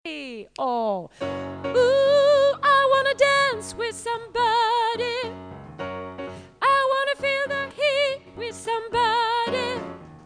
bas

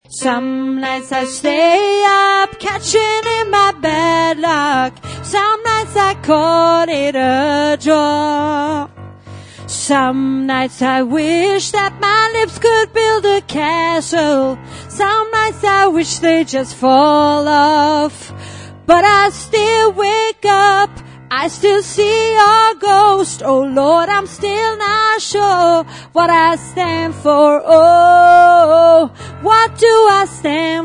alt, stammor

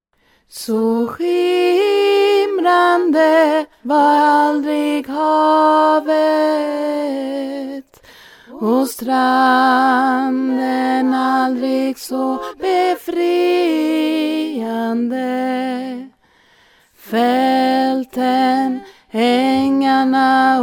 alt

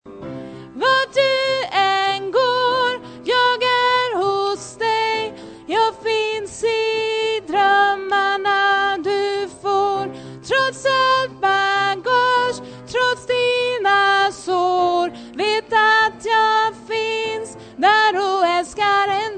vart du an gar bas

